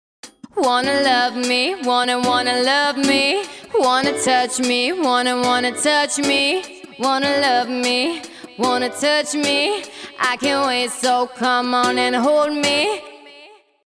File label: hiphop vocal